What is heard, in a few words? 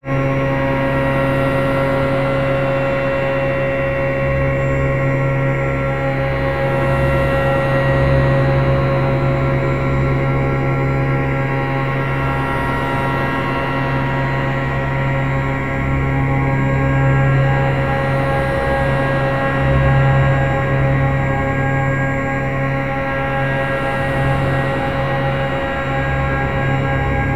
Dark Rumbling Ambience